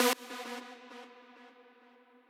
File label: lead